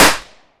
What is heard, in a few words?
confetti; gmod; arccw uc commons attachments